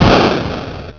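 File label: EXPLOSION; ABREED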